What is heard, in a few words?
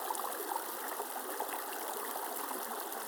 Waterway G Light